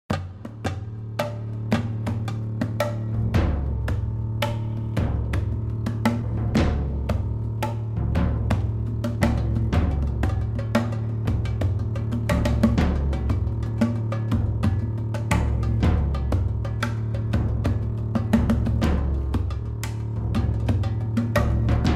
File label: Dark Tribal